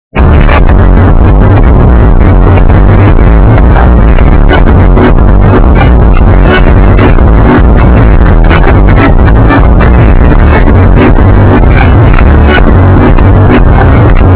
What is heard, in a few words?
radio; Portal